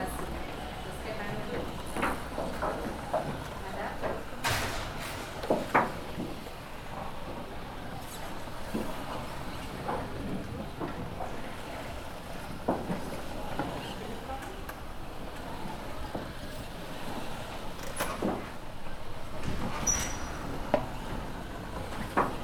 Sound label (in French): sculpture